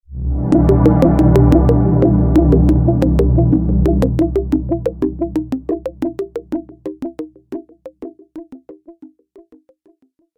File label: Audio Logo Fading Beats, sonic logo